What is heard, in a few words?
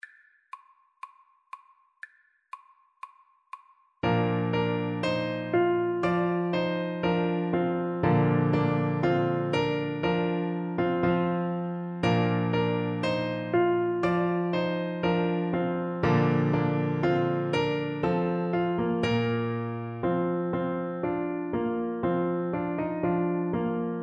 Tenor Saxophone